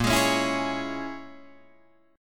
Bb Minor 7th Flat 5th, Guitar, Drop D; 8,11,11,9,9,9

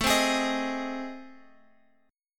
A Minor Major 7th Flat 5th, Guitar Open G

